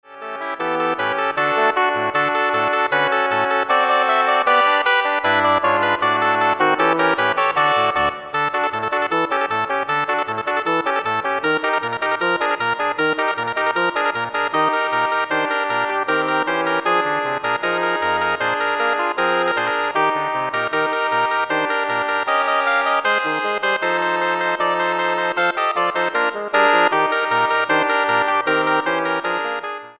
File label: Double Reed Ensemble